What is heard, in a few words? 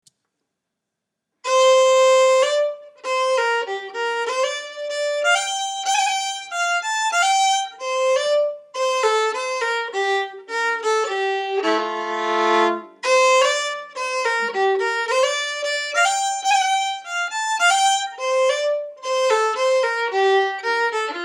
slow; Bamse